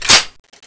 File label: cover down, weapons M249